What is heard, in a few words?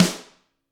GRETSCH SNR; ILIO; Double Platinum Drums 1